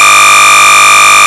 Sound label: SMPTE long; Scripts & Backflips